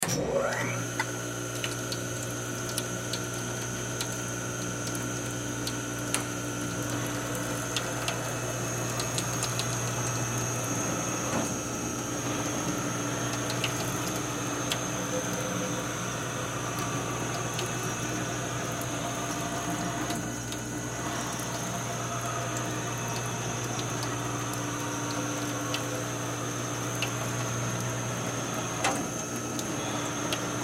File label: Knife Grinder On Off Long; Grinders; Machines; Industry